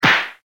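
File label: whip crack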